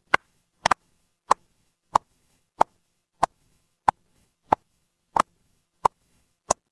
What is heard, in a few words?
footsteps heels